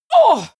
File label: female combat hit soft